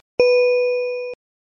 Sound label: correct